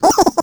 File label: skelly small